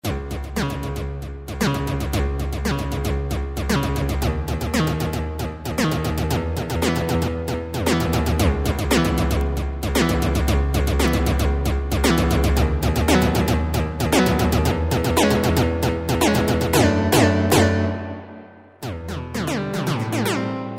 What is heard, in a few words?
guitar analog synth; ramirez